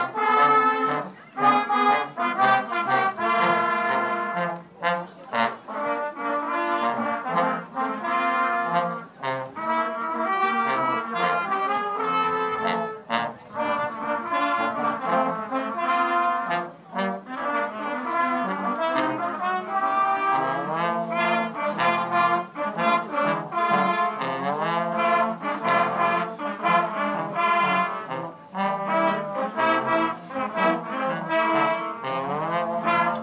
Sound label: beginner brass band